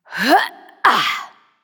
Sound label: Grunt Jump; Seris; Paladins